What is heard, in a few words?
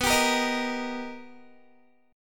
B Augmented Major 7th B Guitar, chord; B+M7; strummed; 19,22,20,20,22,x